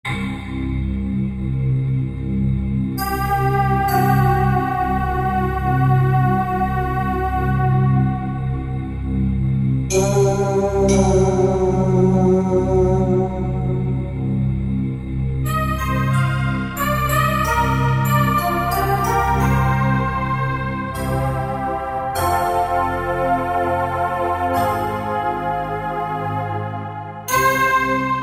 Future Pad; Factory preset demos

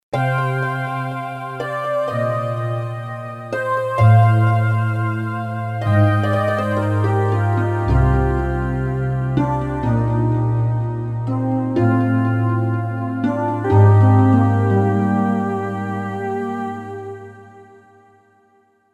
Autumn Breez, Roland JV-880; Factory Patches

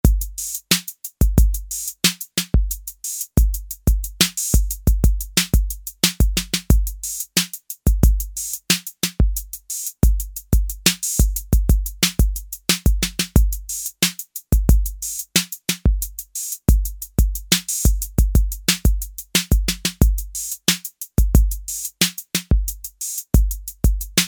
ROCK, Roland TR-808; Factory

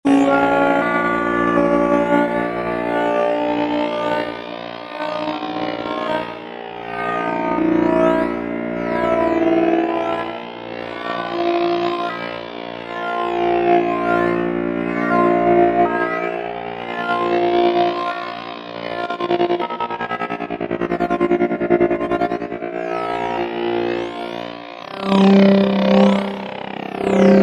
Dive Bomber, Roland V-Synth

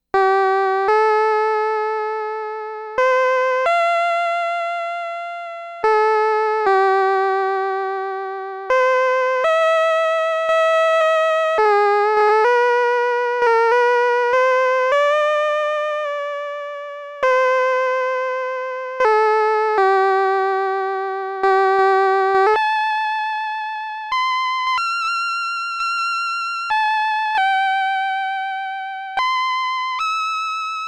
Hawaiian Guitar, Roland SH-2000

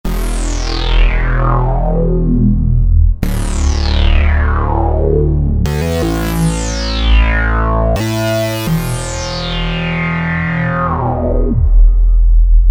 Bass, Alesis Micron; Factory Programs

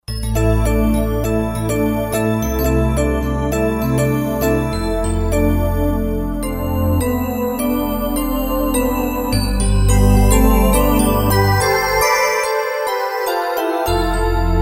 Roland JD-990; Factory Patches examples

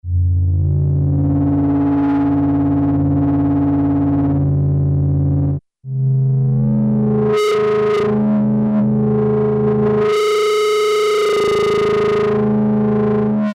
Demon Pad; Alesis airSynth

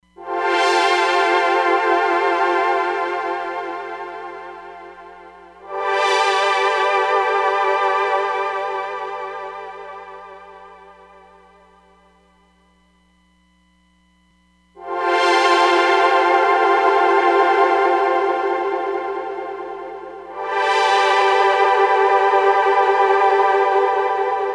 Stereo Filtered Delay, Roland DEP-5; Factory Patches

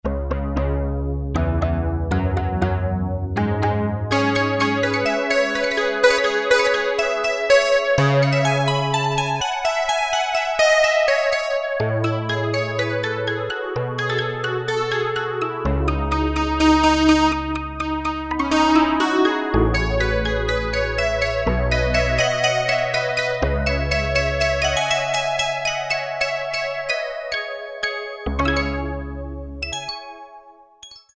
Stick Bells, Roland MKS-70

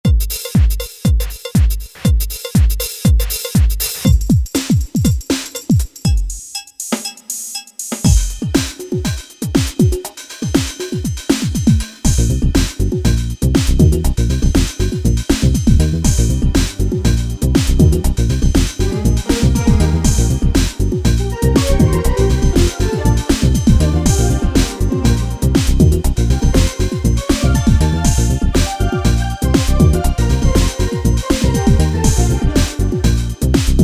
DEEP split, Grooves examples; Generalmusic Equinox

